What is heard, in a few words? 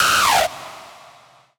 Techno Synth Shot F; Synth One-Shots; Ghosthack; 45 Free Techno Synth Shots 2025